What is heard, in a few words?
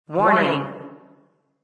warning